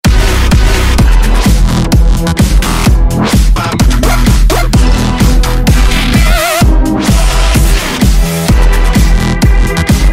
Imperial Funk

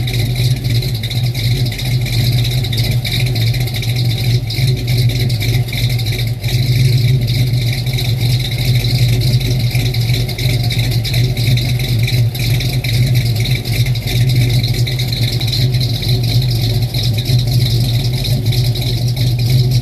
cam timer

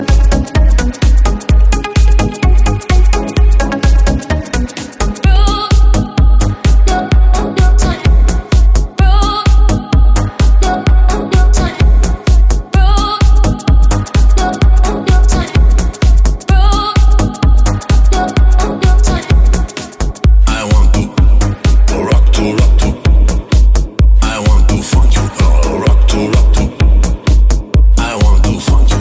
Notif

